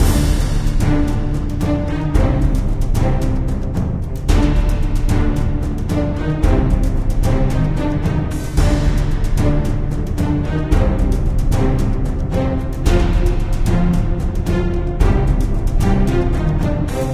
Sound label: Into The Darkness - Battle - Ambient Loop